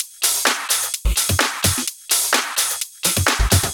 Houseloops 128BPM; Vengeance Samples

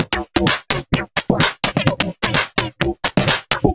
Electro Loop 128BPM; Vengeance Samples

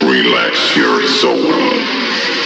Vocal Phrases; Vengeance Samples; Vocals

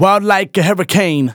Phrase Male Rap; Vengeance Samples

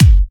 Bassdrums UK Trance Kicks

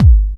Soft Kicks; Vengeance Samples

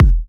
Kicks; Vengeance Samples

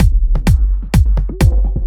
Housebeats, 128BPM; Vengeance Samples; VEH2 Loops

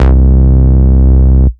Ultimate Bass Root C; Vengeance Samples

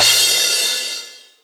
Crash, Cymbals; Vengeance Samples

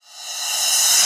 Reverse Cymbal; Vengeance Samples; VEH1 Cymbals

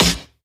Snares; Vengeance Samples; VEH2

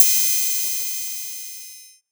Ride, Cymbals; Vengeance Samples

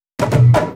Fill 128 BPM; Vengeance Samples; VEH1 Loops

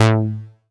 Bass Multi TR A; Vengeance Samples

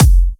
Kick; Your Life

